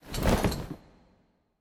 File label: armor; Smite; god Khepri